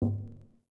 Toggle Off; Civ4 warlords

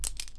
Crab Move; Civ4; Resouces and Improvements

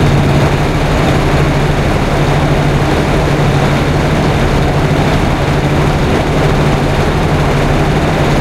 m24 engine int rpm 99 load, engines tanks; War Thunder

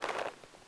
Leather Creak Short; Civ4 warlords

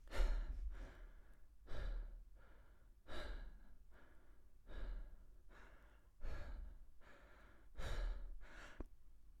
breath ok; Escape from Tarkov; usec2

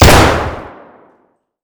rem870 fire indoor close; Escape from Tarkov

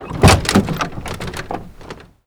door truck military open; Doors; Call of Duty Modern Warfare

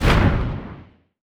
depthbomb; World Of Warships; weapons

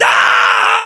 melee charge, voiceovers; english

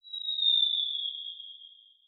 damage hits explosions, Warships

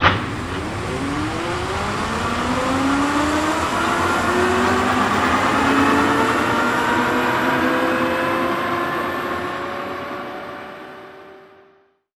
Aircrafts MiG-15bis VK1 Start; DCS

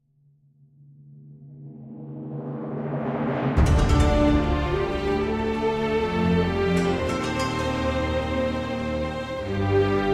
defeat theme; china; War Thunder

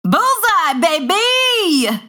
Hit Confirmation; World Of Warships

